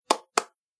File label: Two Hand Claps; Body Sound Effects; Human Body Sound Effect